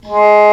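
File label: English Horn mf G#2; Digital Sound Factory Virtuoso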